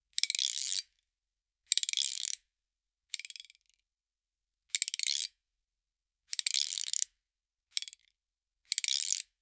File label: fishing rod cast swish